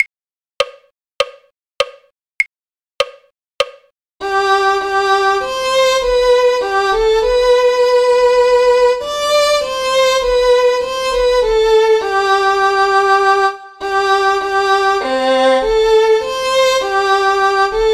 violin; carols

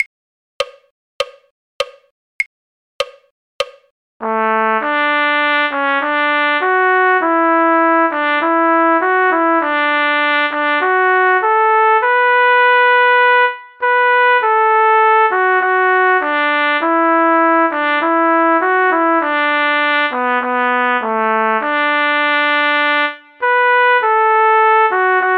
trumpet; Auld Lang Syne; folk

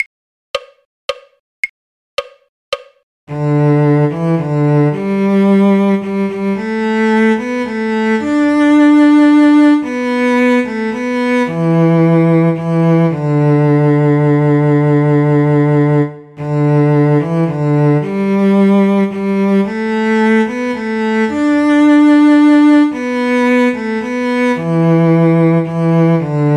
cello; skye boat song; folk

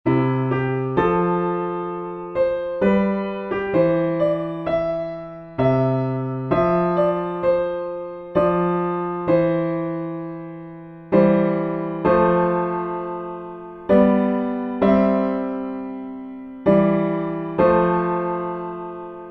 piano backing, hymns; recorder; i vow to thee my country

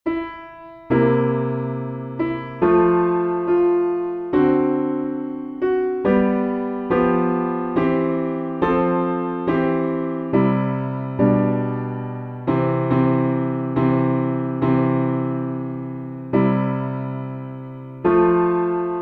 piano backing, hymns; Eternal Father Strong to Save

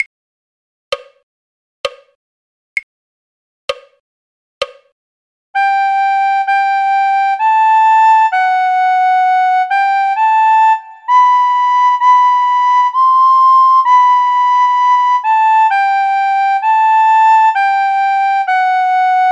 god save the king queen recorder; folk

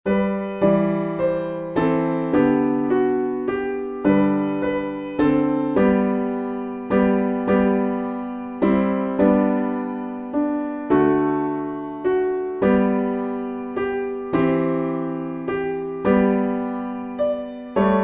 piano backing; hymns; recorder piano hymns

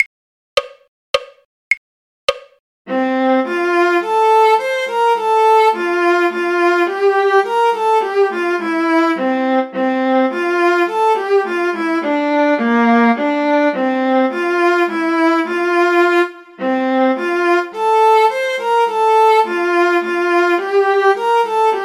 the ash grove viola; folk

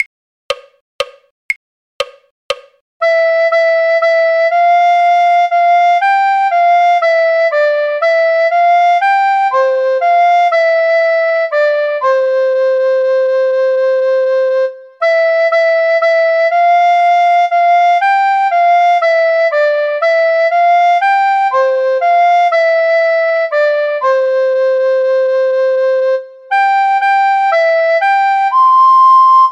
drink to me only with thine eyes recorder; folk

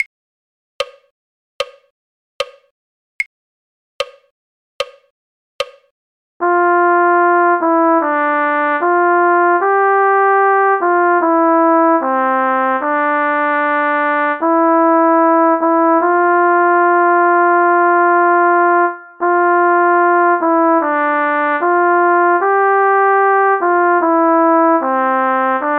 all through the night cornet; folk

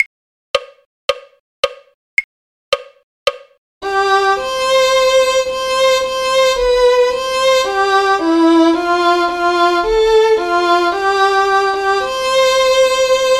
violin; carols

